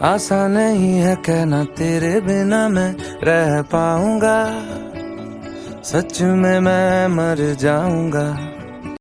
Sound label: Ringtone